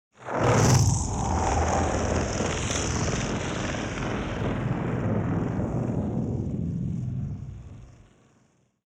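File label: tongue of flame burst out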